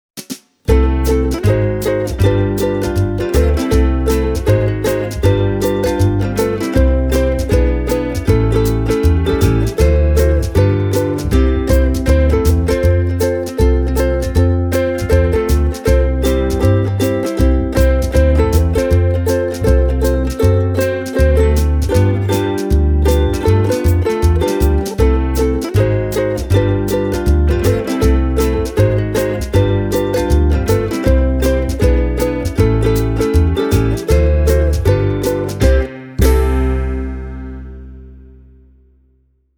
Ohana SK 14

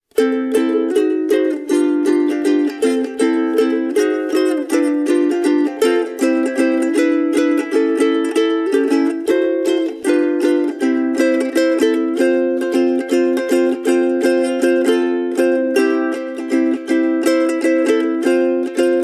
Martin 0X Bamboo solo

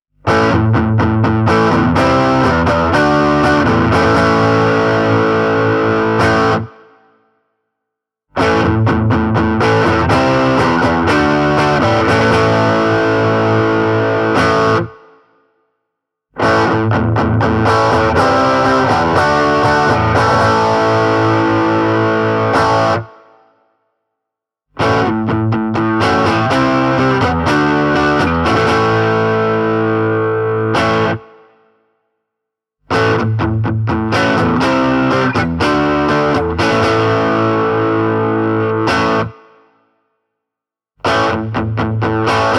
Hagstrom Viking DLX CSTM overdrive; puoliakustinen sähkökitara

